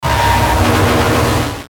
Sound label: static hum filter; Static Hum Filter Swell; Production Element Imaging Element Accent Transition; Blastwave; Static Sound Effects